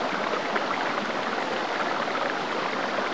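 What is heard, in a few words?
pool loop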